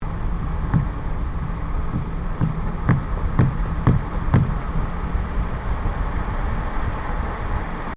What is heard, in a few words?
Car tyre noise medium catseyes; Cars (Sound Effects:Vehicles)